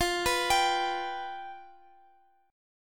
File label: F Suspended 2nd Flat 5th, F, Guitar; Fsus2b5 arpeggiated; 4A,4E,5A,5D,5G#,6C#; x,x,x,15,15,18